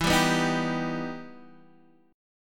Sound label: E Minor Major 7th E down Guitar Open D